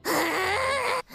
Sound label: Growl; Frankenstein